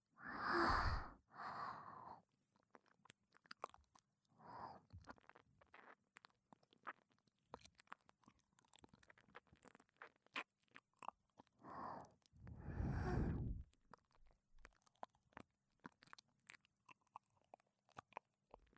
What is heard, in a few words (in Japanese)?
耳舐めのみ 雨音なし, ASMR; 耳舐め ループ用セリフなし